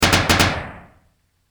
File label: Gun Rapid Gunshots; multimedia